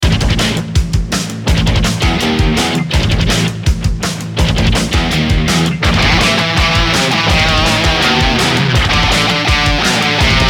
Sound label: Spooky Mansion